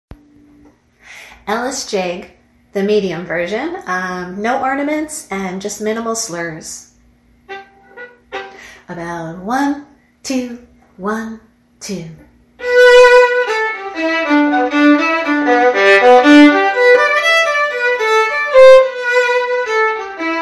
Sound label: ellis jig medium, fiddle